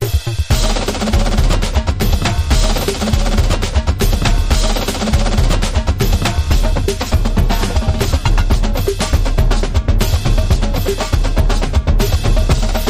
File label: R&B Sway